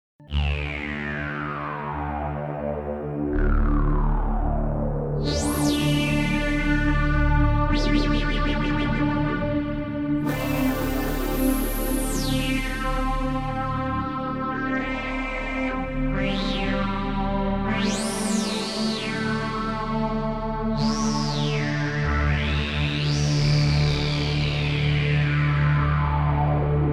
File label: sweep